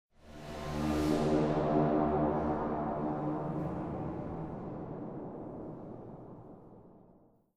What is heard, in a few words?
stinger; Myst III Exile